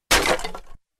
breaking wood; شکستن چوب

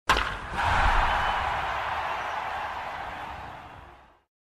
baseball

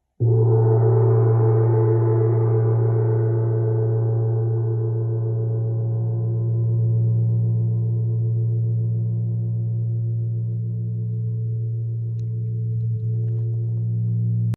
Tam Tam

